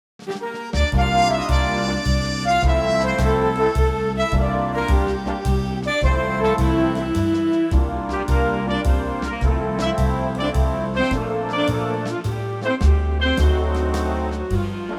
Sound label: Big Band